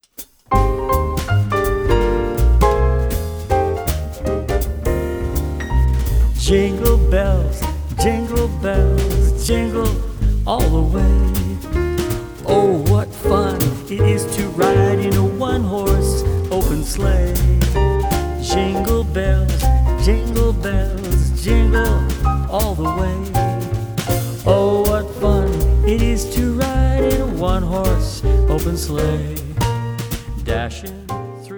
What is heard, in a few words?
Jingle